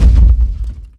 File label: damage hits explosions; World Of Warships